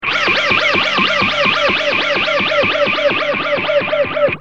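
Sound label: guitar, final phase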